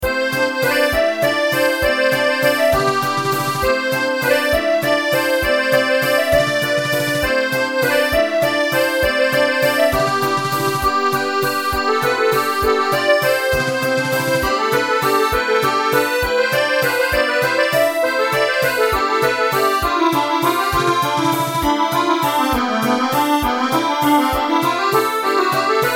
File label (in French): valse; cloet patrick; cominoise